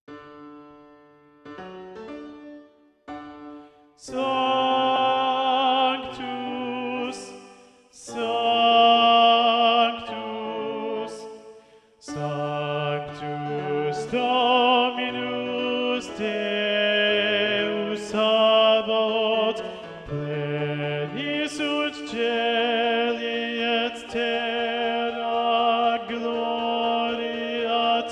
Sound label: Bass; Mass No2 in G Mvt 4 Sanctus; Practice Room